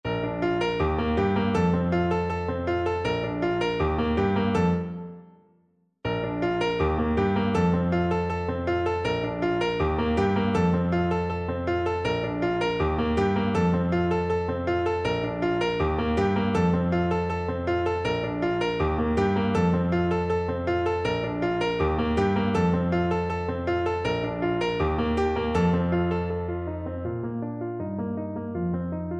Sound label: la bamba, Tuba